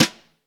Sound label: Vintage Snare Drum